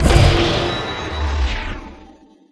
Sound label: pickup